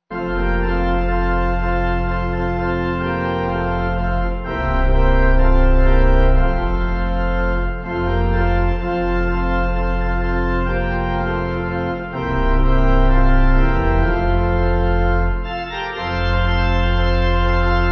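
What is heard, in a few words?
Pipe Organ; S.J. Graham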